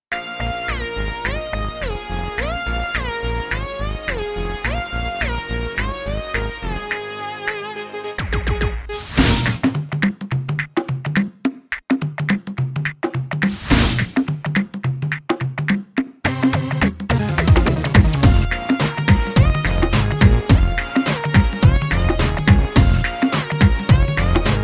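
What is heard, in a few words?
background music, Telugu Ringtone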